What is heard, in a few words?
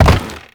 land wood; Movement; Warframe; Uriel